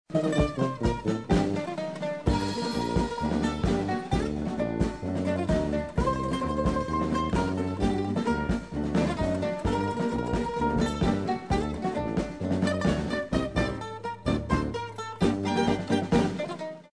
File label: Jewish Toska